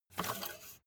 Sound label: car bmw e46 exterior oil rod insert fast